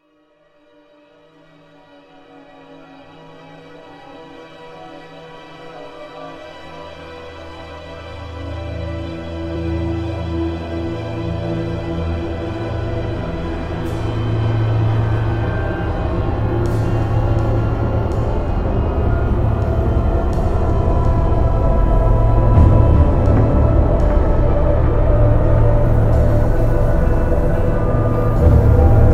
ice caves; Torchlight II

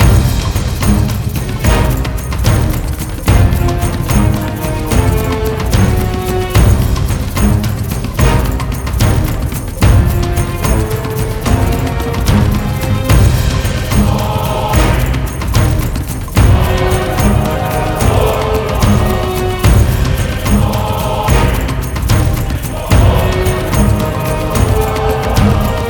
Garden Boss LOOP

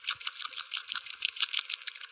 Sfx - Bug Eat; Farm Mania 2

